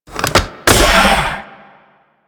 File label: Key Remove; Warframe; Captain Vor